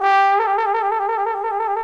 TROM DOIKS

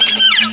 VIO MISC, MISC VIOLINS; Best Service; World Colours

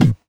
BD; Best Service; Dance Mega Drums

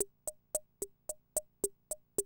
CR78, DrumLoops

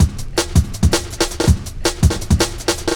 Ice 162, breaks; minihalfs

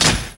HYDRAULIC; Northstar; The Wizard VOL-1

